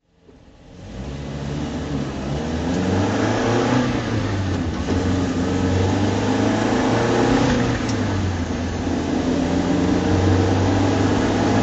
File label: facel III drive